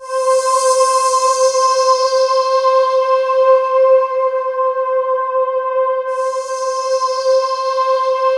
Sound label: C3, SynthLine; 90 s Sample CDs; NiceBeats; SynthLine VOL-5